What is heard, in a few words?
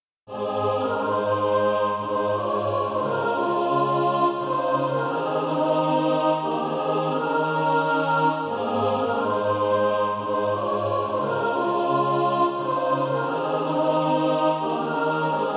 mixed